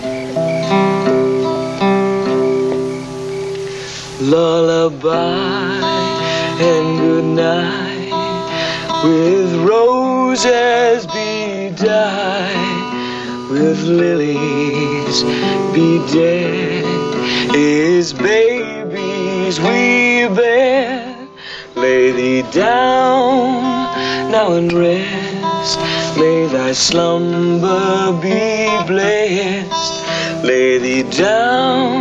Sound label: Brahms Lullaby